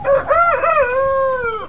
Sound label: Cockerel Big; Birds